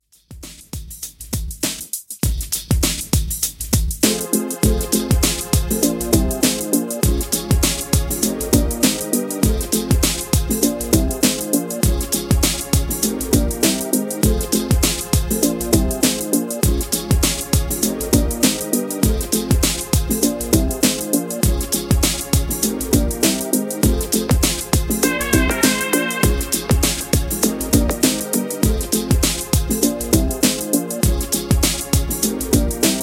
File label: 90s Club Classic 100 Bpm